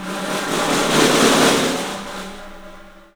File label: PICSNF, 3D Drums, PICOLO FLAM; 3D Sound